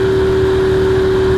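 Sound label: engine exhale, advanced engine sounds; engine2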